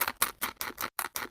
eat fast; ema

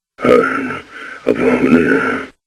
mumble

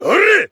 attack

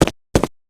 footsteps

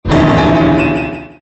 shock; ryota mitarai

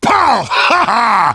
finger gun; heavy

